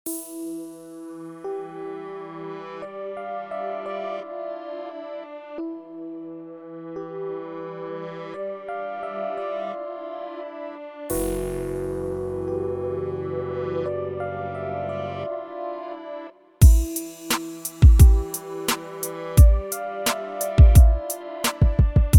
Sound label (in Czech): melodii beat; k vasemu zpevu